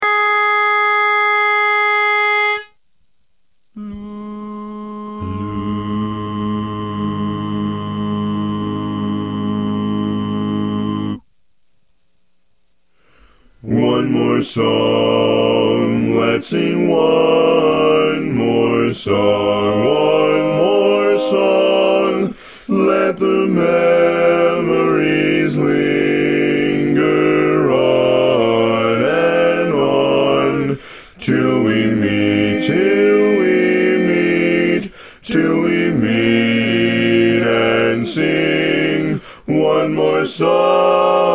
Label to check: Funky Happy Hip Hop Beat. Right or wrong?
Wrong